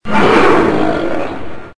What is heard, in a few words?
rawr; Desu Kappu